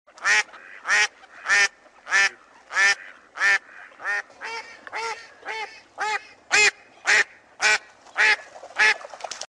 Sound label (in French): bruit De CANARD cancanement; animaux